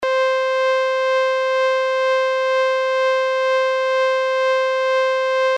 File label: パン LFO